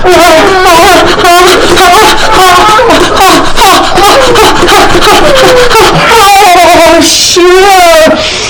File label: moan moaning loud